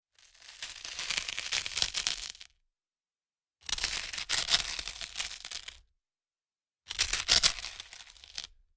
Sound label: tearing crunch